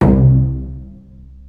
SOLO PIZZ, Strings

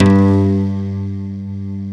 nylon, JD8 synth

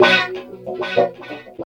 GTR; East West; Phat & Phunky

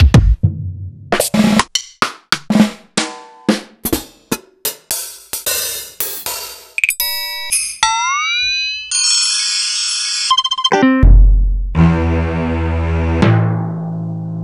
R&B Flava; proteusvx

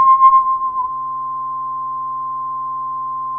Elec pian A4, Fairlight CMI IIe, Pianos

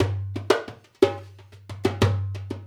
Dumbek; Essential Percussion

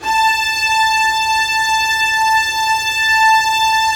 STR SEC, STRINGS; Roland - LA Composer vol. 1

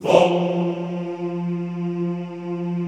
VOHMMM F2, Classical Choir; Best Service; Extended Classical Choir

